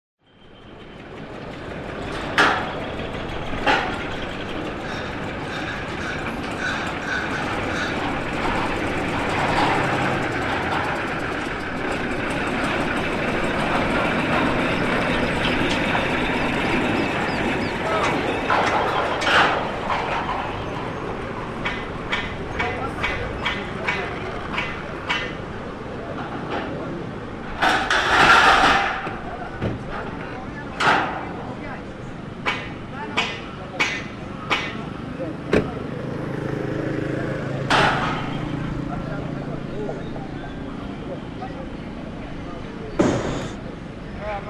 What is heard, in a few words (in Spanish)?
parques preparando encuentro colectividades; nal bandera